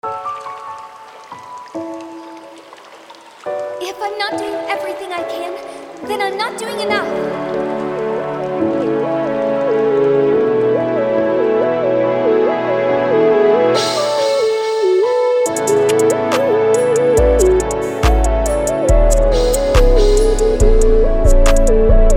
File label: plugg loop